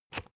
spring binder lock

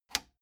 plastic button latch loose release disable

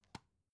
dvd case close soft

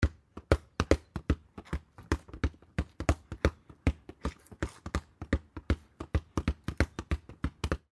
child playing with ball

